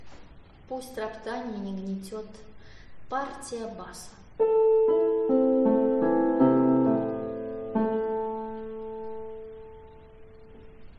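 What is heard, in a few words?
bass; Pust roptanie ne gnetet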